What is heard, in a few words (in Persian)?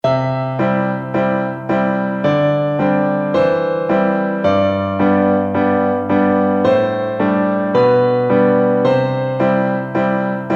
Ringtone Bikalam; Sad